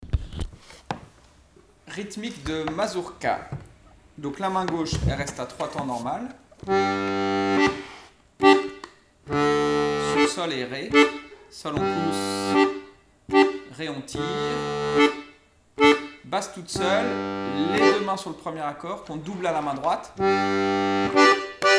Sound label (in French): rythmique mazurka simple; ateliers diato 2; L'atelier de la casserole